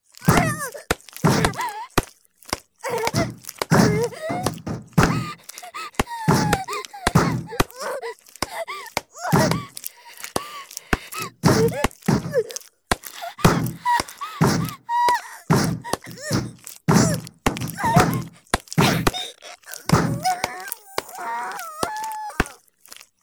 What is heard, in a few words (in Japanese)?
叩きながら, 効果音, ASMR; 効果音あり